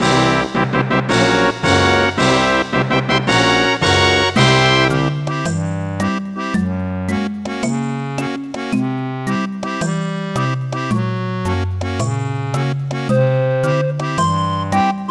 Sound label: Secret Fanfare; Treasure Trove Cove, Rusty Bucket Bay; Nintendo 64; Banjo-Kazooie